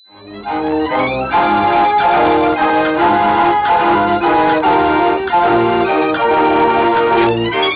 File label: Street organ